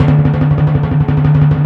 PRC D FELT, Orchestral Perc, Timp; Roland LCDP03; Ambo TimpRol

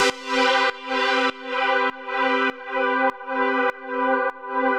Pad 100 C, sidechained samples 100bpm

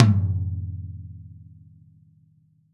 DW Green Maple Tom; Sonic Foundry (Sony Creative Software); Vital Drums

